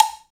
Cowbell Hi, Roland BK-5 Percussion

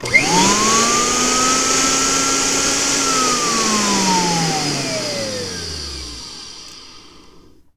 BLENDER RU, Kitchen Counter; E-MU Producer Series Vol. 2; More Studio Essentials

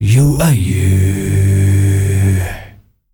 male 5ths, S. African world voices; ILIO; Vocal Planet VOL-5

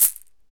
SHEKE, Afro Shakers, Shekeres

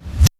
BD Revers, Electric Kick, Yamaha DTXpress

